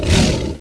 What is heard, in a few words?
blood attack